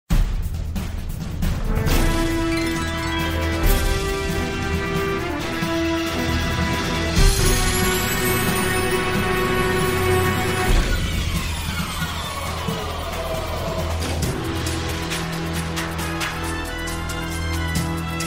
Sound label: dragon Tower Boss; dragon Saga